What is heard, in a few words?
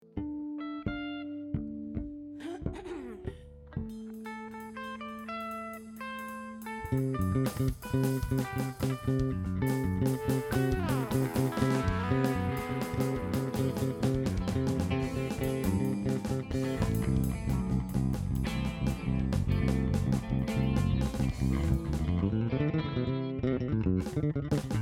Strings Mix